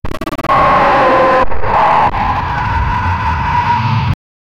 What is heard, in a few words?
deep robotic laugh; artificial intelligence